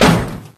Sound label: door; misao gamerip